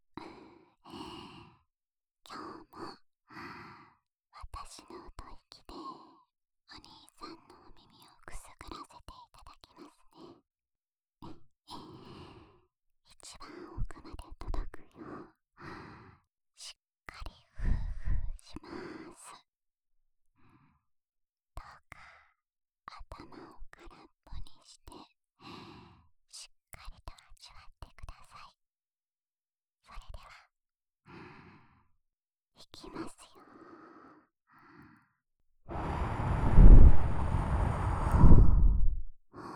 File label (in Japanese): 安眠用お耳ふーふーループ（右耳）, ASMR